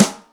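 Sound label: Brush Rim, Electric Snare; Yamaha DTXpress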